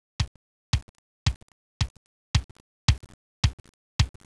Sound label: bass drum